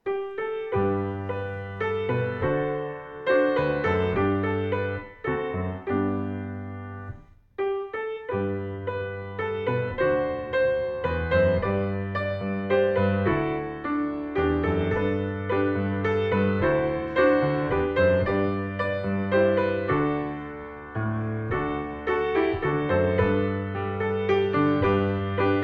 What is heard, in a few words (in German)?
instrumental; was soll das bedeuten; engelschor lieder